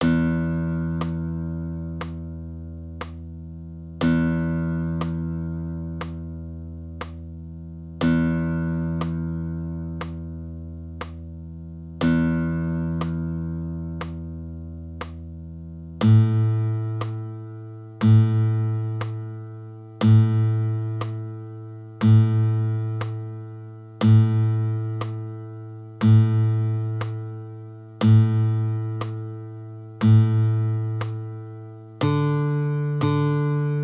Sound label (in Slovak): Rytmicke cvičenia nôt